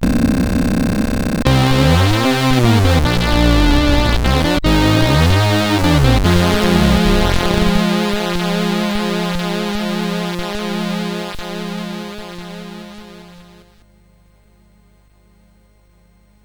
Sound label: CSG8580R5; sid